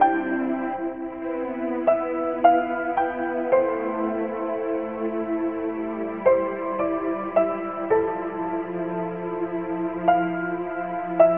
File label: piano and strings, Sad Loops